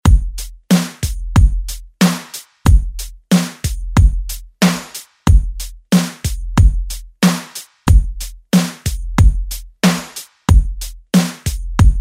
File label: 90s 92bpm